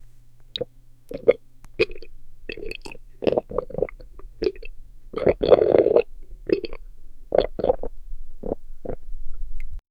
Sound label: person taking a drink liq